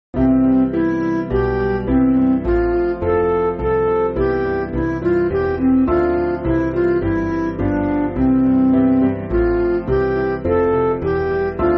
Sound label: Midi, Music Backing Files for Churches; Christ, the Lord, is risen today, Alleluia!; Easter Hymn